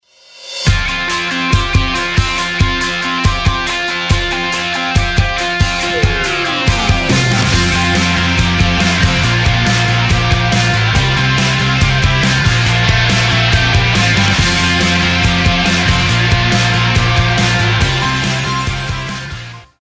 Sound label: Rock